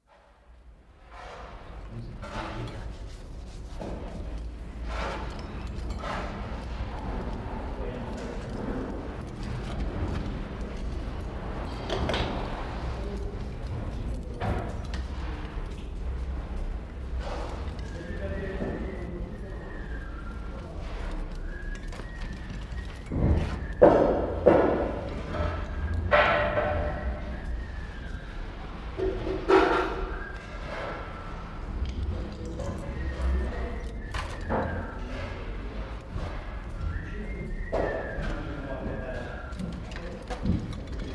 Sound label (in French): communication interpersonnelle siffler travaillant